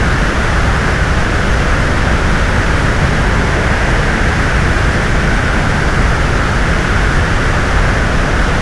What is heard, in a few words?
Aircrafts Plane Fur Wind; DCS